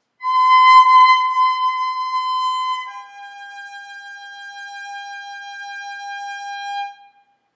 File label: Opening Violin; Cheryl Violin Project